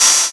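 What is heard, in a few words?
Cymbals open hi-hat; Vengeance Samples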